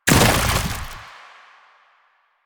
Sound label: Infested Move Turn; Warframe